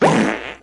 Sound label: fart; svencoop